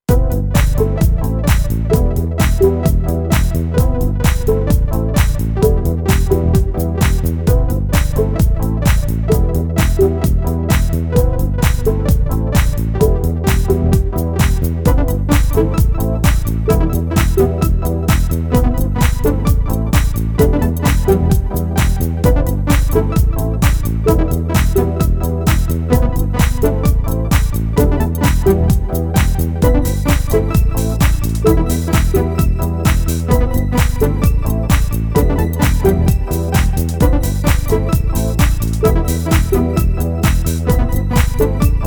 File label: QY100 DISco